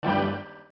combo; Puffer Popper

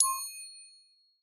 counter; CrossCode

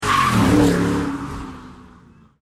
mustang pass; fordmustang

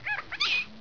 move ball; playforia; Ghosts

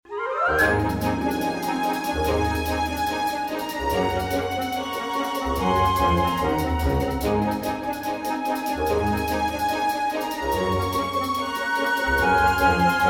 bg loop; barbie; puppy water slider

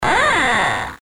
door; patcheconwarsdx

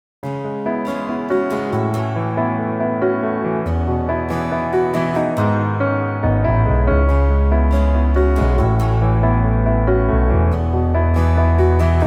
Emotional Acoustic Version

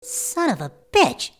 sob

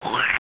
punch splat; 2fast2curious; boss punchout

